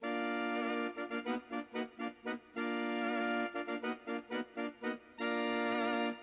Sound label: pigs